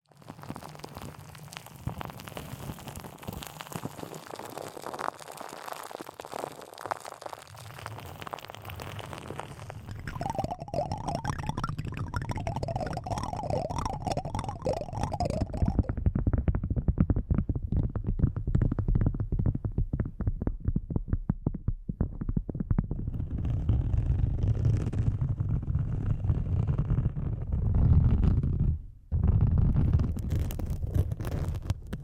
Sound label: asmr brain massage no talking; ASMR by Coromo Sara